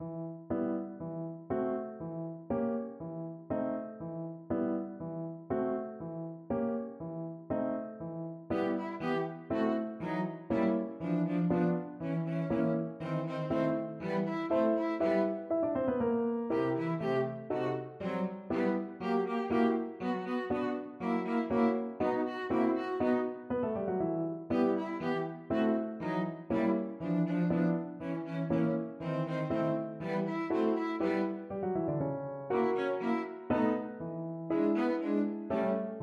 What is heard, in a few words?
sugar plum piano trio; tchaikovksy